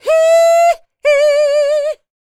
D BIT, Vocal R&B Dance

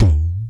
VOC DRUM, MOUTH; MOUTH PC

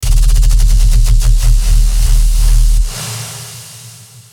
Dist Tones; neuro; BIG5AUDIO

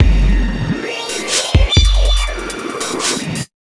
Big Beat Drumloops, SPEED 140

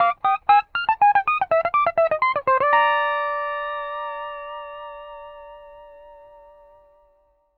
PD LOOPS 120, Whole Lotta Country

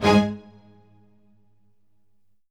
ORCH HIT; Q Up Arts; Denny Jaeger Private Collection

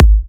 Kicks G#; markus hakala

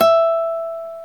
GTR Nylon, Roland

